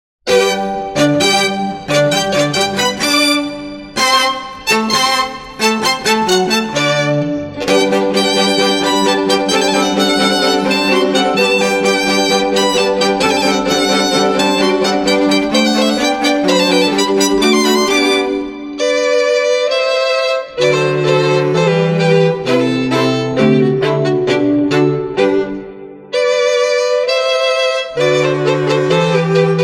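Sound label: Serenade No 13 for strings in G major K.525; Eine kleine Nachtmusik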